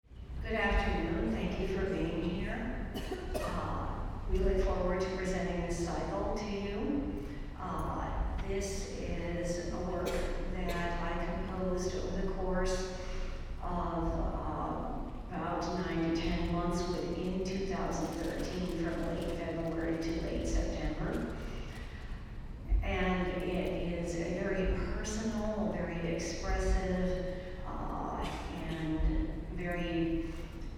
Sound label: Mezzo Piano